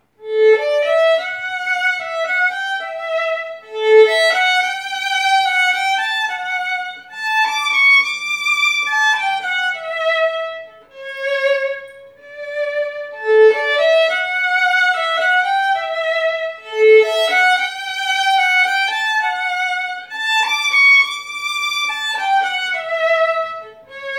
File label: Strad